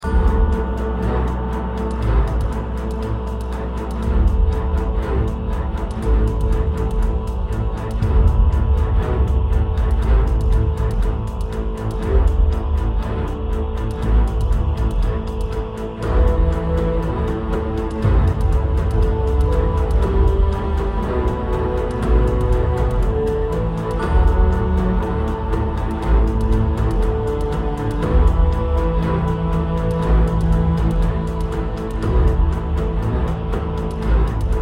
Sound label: Build Up Dungeon, Music; Dungeon Defender; DunDef